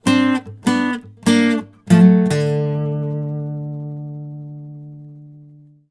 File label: BARI LICK, Blues Guitar; ILIO; Fretworks